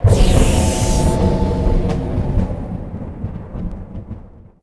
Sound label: function health reset